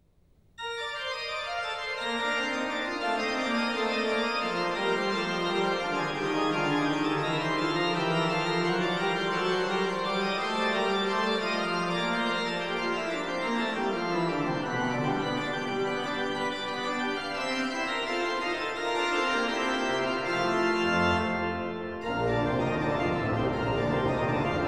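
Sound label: Prelude, organ; J. S. Bach; Piet Kee Plays Bach, Volume 3; Eight (short) Preludes and Fuges; No. 8 in B flat major, BWV 560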